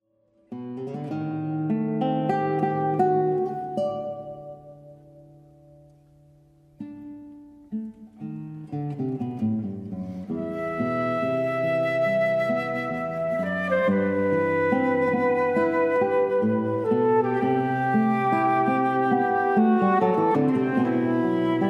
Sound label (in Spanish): con flauta